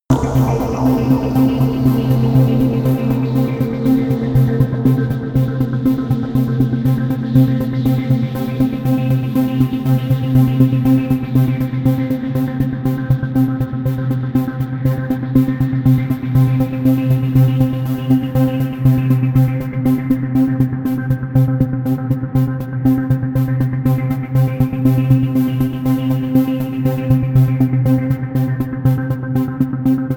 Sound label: sequence buzzing